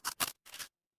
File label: cartoon chomp